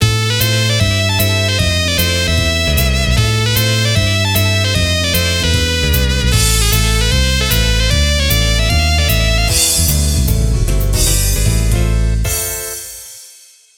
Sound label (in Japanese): クリア・ミュージック; Namco Music Selection Vol.1